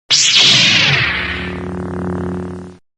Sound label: lightsaber